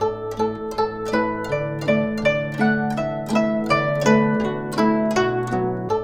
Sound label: GUCHIN 90 D; INIS - Opium